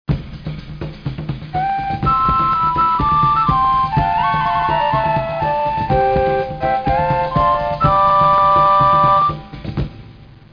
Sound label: Anthem